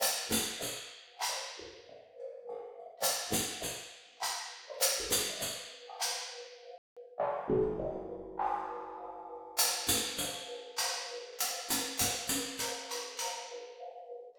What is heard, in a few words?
Kabuki Metal, Rhythmic Atmospheres; Ambient Cinematic